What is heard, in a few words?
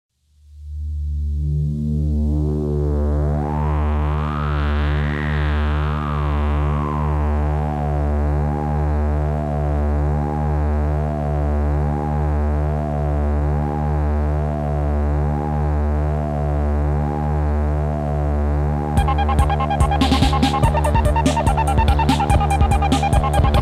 Electronic Madness twentieth century baroque